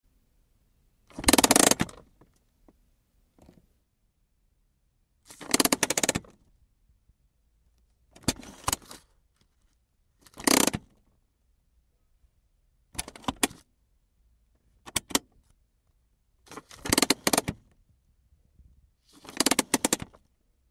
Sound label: Handbrake Sound Car